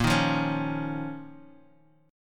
Eb 7th Suspended 2nd Bb

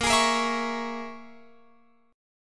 Bb Minor 7th Flat 5th, Guitar, Open F; Bbm7b5 strummed

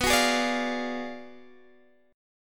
B Minor 6th Sharp 11th B

